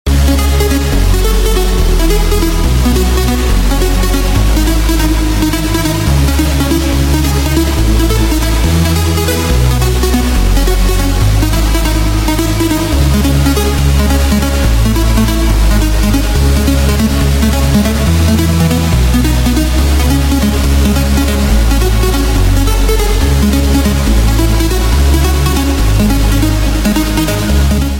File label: pirates trance remix